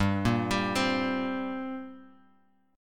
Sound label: G Minor Double Flat 5th, G, Guitar, Open G